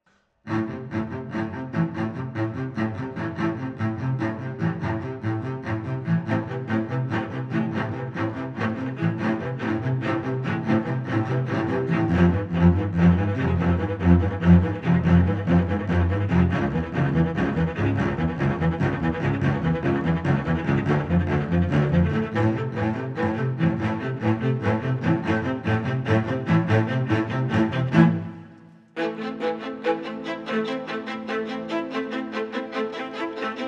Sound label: Underscore, Classical Cinematic; Narrative Mind